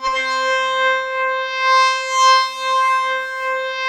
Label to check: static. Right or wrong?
Wrong